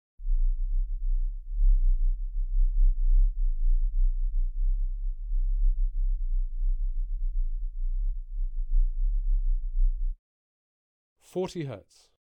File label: 315Hz; Alan Parsons & Stephen Court - Sound Check 1993